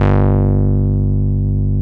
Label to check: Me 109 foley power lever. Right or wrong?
Wrong